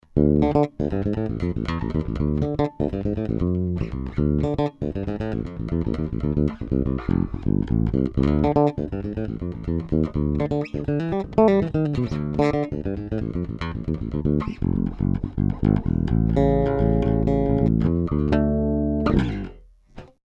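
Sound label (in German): JB-75 Fingerstyle Bridge PU Tone voll auf, bass; SB Vintage Series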